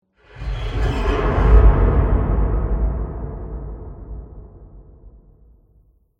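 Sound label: Ambience Quadshot Metal Scrape Lite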